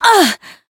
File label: Voice damaged; hiina